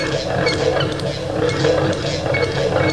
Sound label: flying machine